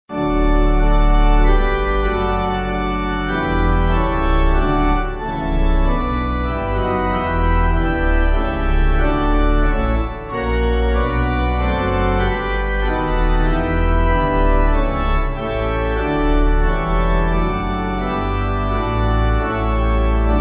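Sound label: Pipe, hymn backing; St Sepulchre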